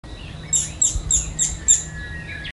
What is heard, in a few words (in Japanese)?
kogera